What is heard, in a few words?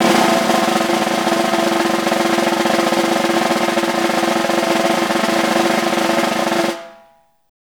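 snare roll pianissimo; Best Service; Peter Siedlaczek's Advanced Orchestra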